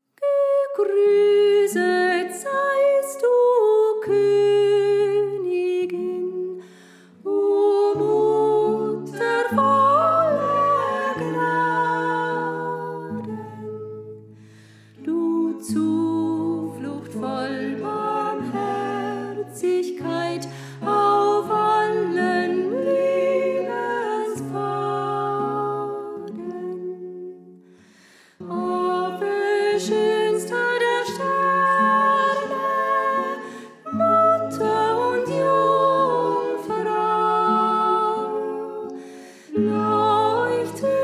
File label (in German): Sopran; Gegrüßet Seist Du Königin